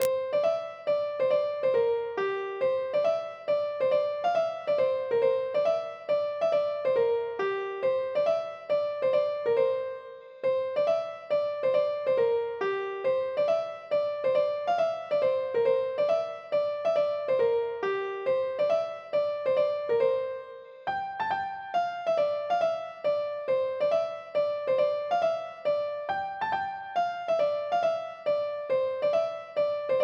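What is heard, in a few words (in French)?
mazurka; boeuf trad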